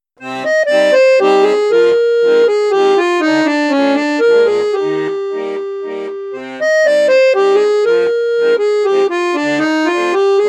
registre basson; piermaria prodige plus

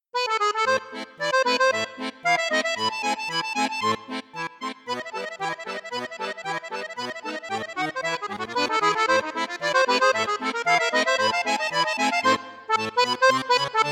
registre flute boite flute, accordeon

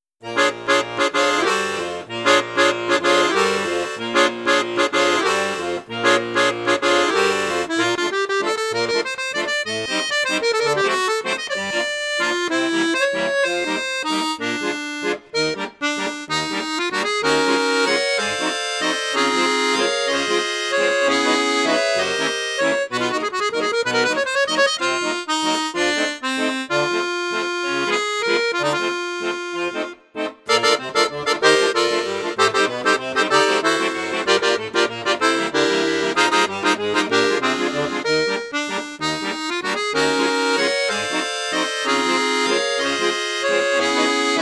registre flute juste, accordéon; cavagnolo orchestre v

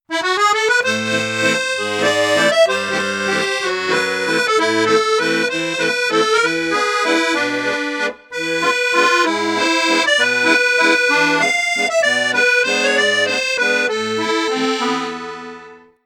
registre flute juste flute basse, accordeon; maugein festibal trio

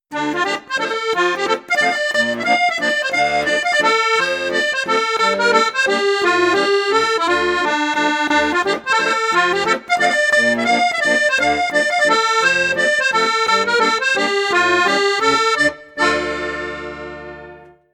registre flute juste flute haute, accordeon; accordiola

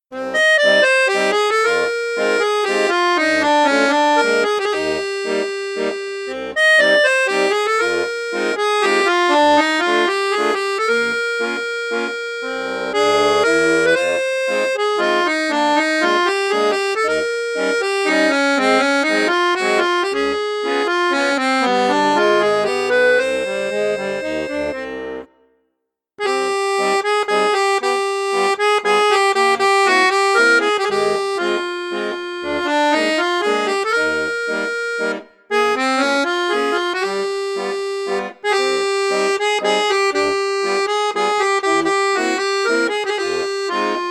registre basson; piermaria convertor

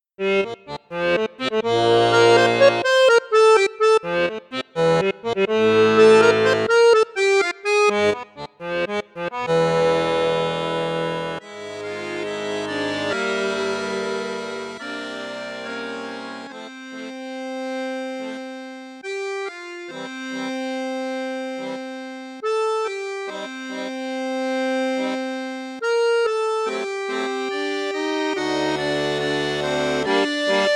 registre basson1 basson2 flute juste, accordeon; cavagnolo vedette 10

